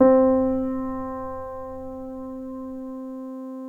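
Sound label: Piano; Synclavier Sampler Library; KEYS AND GUITARS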